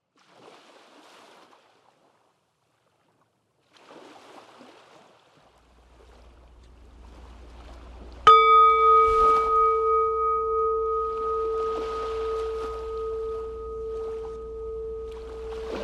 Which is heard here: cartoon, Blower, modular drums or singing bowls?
singing bowls